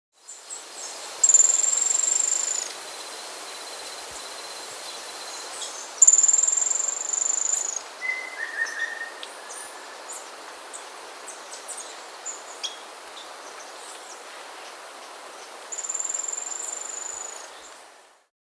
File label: Ocellated Piculet (Picumnus Cirrhatu; S. America